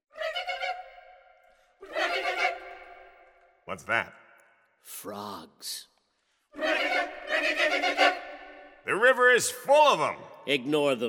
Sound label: frogs